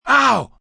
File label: Pain Breathy Ow, Pain, male; VoiceBox